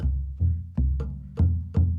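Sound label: Calm Far, music; From Dust; ps3; xbox 360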